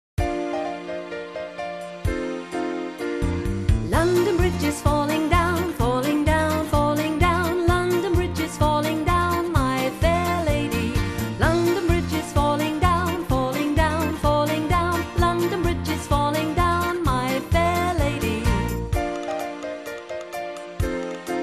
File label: London bridge is falling down